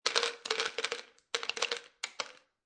Pills Drop Into Bottle Many Large, Foley, Pills Dropping Into Plastic Pill Bottle, Multiple Large Vitamin Pills; Drop Sound Effects